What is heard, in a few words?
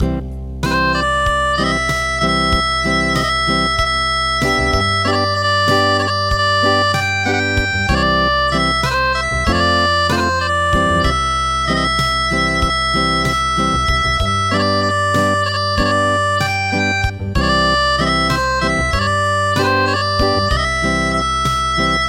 Yemen Song Acoustic